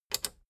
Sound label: plastic button small latch click disable fast